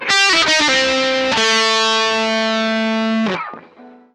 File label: rock guitar ending riff